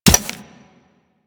Grenade Hit Dirt; Warframe; Grineer Ghouls; Ghoul Expired; Attacks

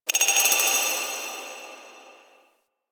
Clockwork Texture Small; Warframe; Ambience; Orokin Moon

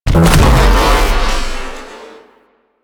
Baby Dragon Death Explosion; Enemies; Warframe; Duviri; Void Dragon

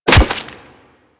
Melee Staff Impact Flesh

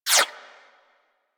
Laser Whizzby; Warframe; Weapons

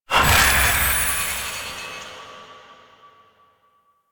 Puzzle Confetti Burst; Warframe; Duviri; Bombastine Encounter

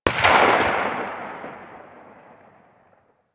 Weapon Enemy Tail Outdoor; Warframe

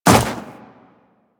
Run Snow, Footsteps; DE; Warframe; Tenno

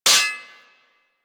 Melee Sword Impact Generic; Warframe; Weapons; Swords

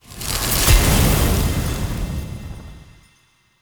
Starter Melee Rune Cast; Powers; Runes

